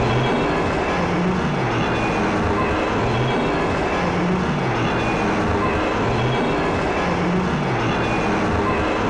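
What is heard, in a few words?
Scrape Interior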